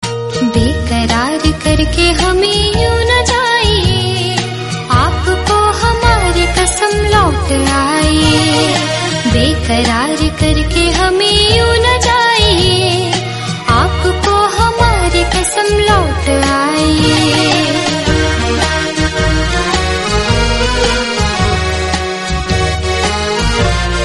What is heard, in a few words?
female version hindi